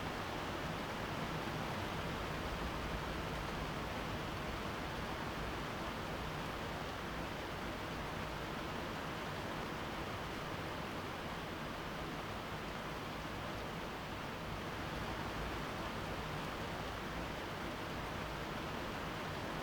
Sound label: indoor janitor room rain; LOOP; anemoi